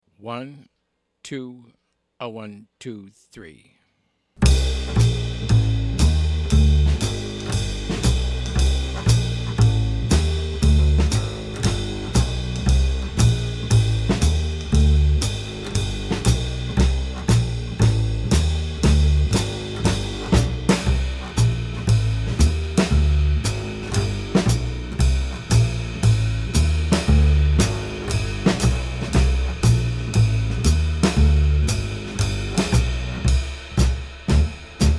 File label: bass drums only